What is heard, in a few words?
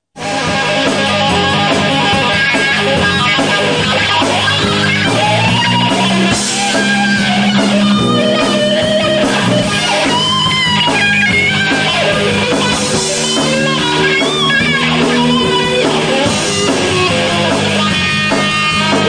rock, squier; 86 squier